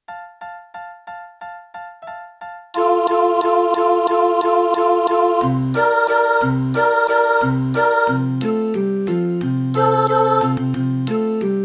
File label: jingle bells alto